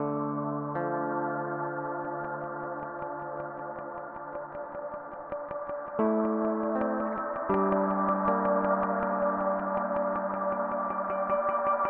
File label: Strymon NightSky